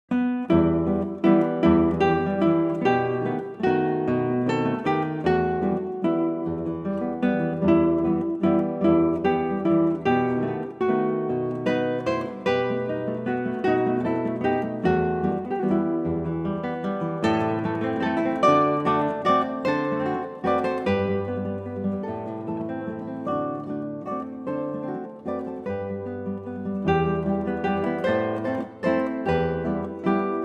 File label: Spanish folk song; El Roble